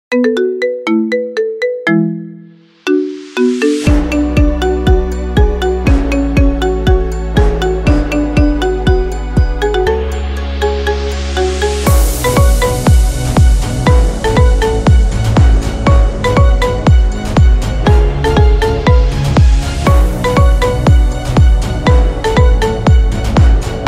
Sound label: Marimba